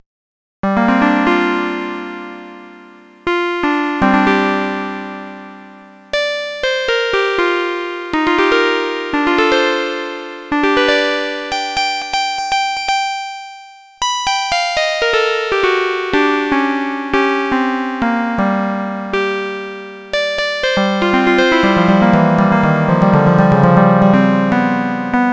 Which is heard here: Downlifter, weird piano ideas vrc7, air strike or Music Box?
weird piano ideas vrc7